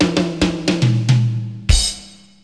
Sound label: Rimshot